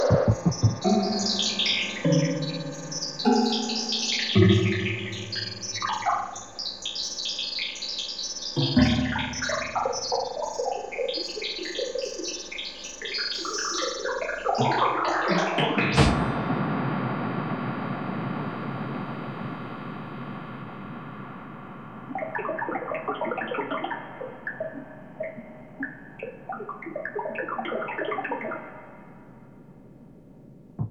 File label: percus reson Obst Metal drp music